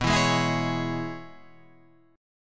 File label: Db Minor B; strummed; Dbm/B; JGuitar